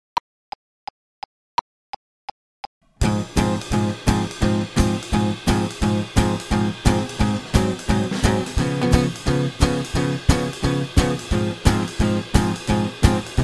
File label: blues swing